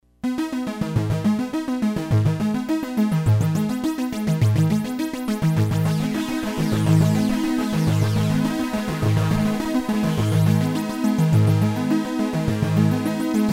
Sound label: Sharp Square; Kris Rhen Presets